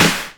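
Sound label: SD, snare drum; X-Static Goldmine 1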